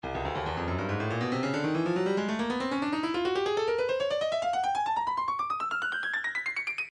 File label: breakbeat 140 bpm; Stylus Breakbeat Midi Files 140 170 Bpm; Wriggle